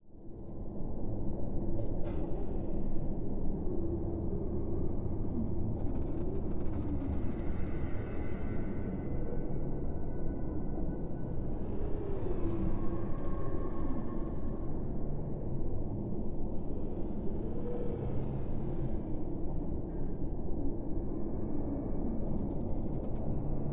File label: spooky ambience